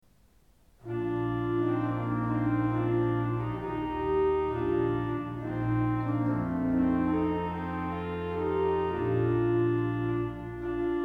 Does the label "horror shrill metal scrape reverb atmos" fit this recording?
No